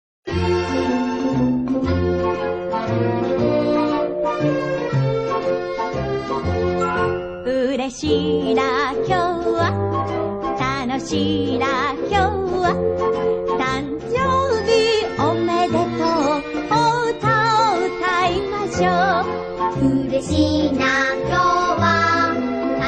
Happy Birthday Song in Japanese

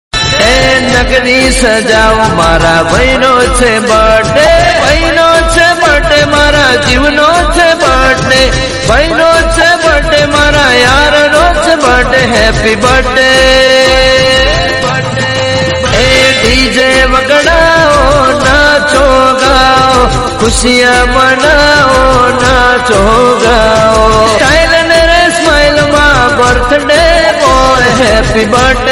Gujarati Birthday song

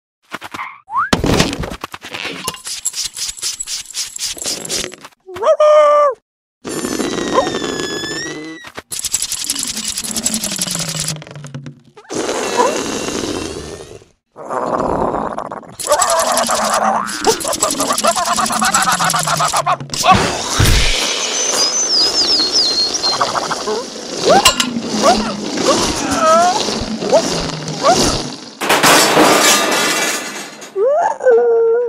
dog Happy Birthday

